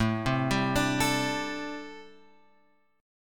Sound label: A Minor 11th A, Chord; Am11 Chord {5 3 5 X 3 5} arpeggiated